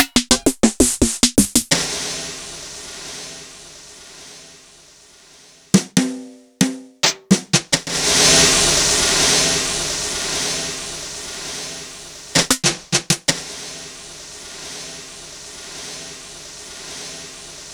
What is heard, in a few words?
Yamaha DTXpress Electric Snare